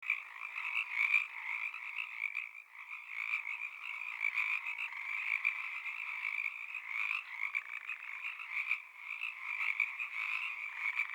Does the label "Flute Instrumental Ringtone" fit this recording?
No